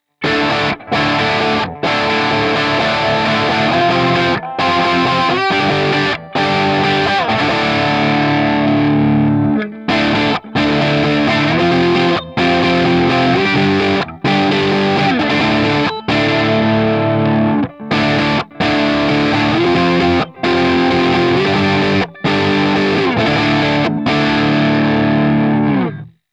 Epiphone Les Paul Bridge Both Neck Indiestyle

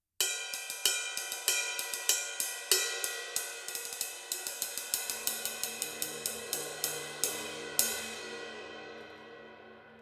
Vintage Pure Ride

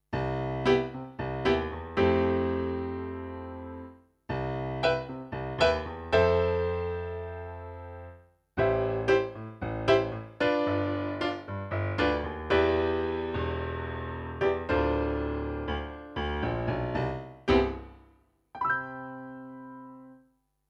Yamaha P105 Grand Piano

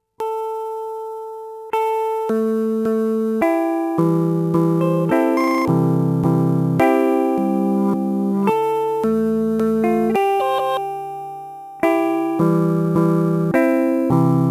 Stutter; Ottobit

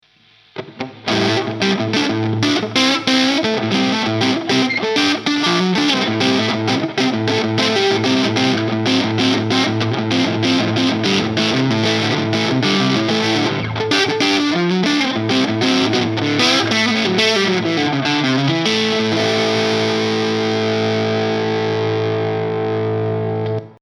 hals zerre

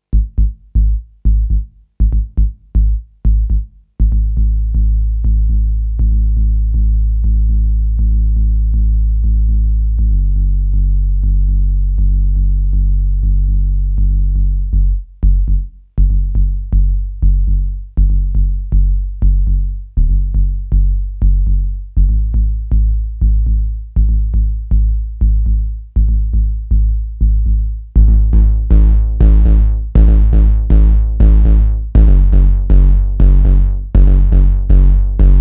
120 C Square Howl Bass

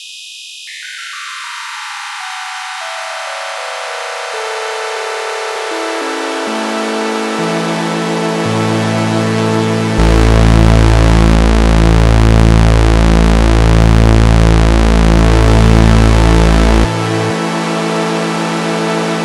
Panharmonium Center Frequency; Rossum Electro Music

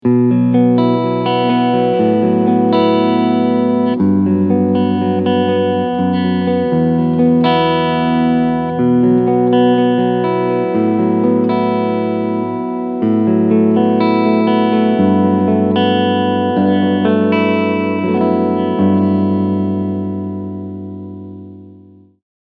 Clean Position II

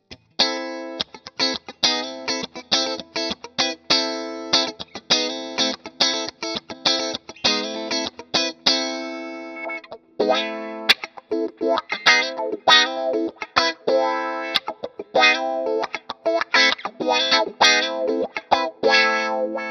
Funky

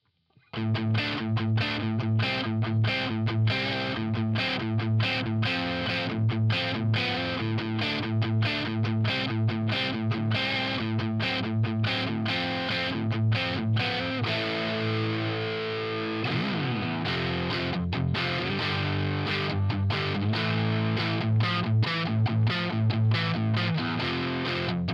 Jackson SL2 both DIST

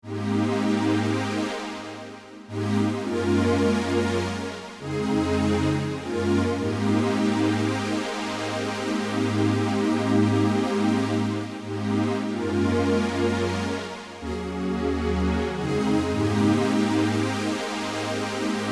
Waverunner Orange

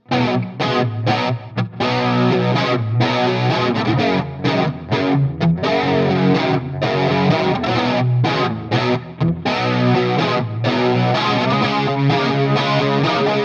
Old Times; Valeton GP-200LT

